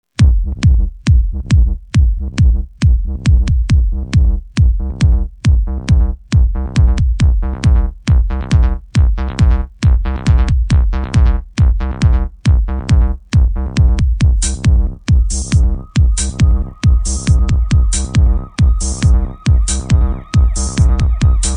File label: instrumental, persian